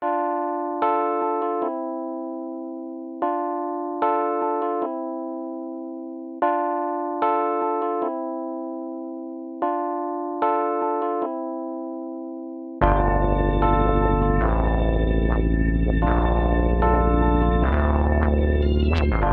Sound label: Instrumental